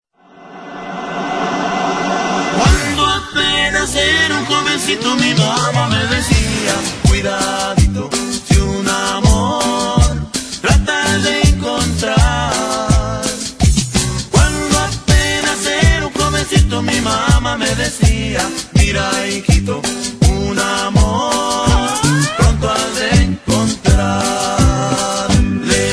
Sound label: cumbia mix